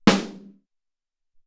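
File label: Hard Snare, Alesis SR-18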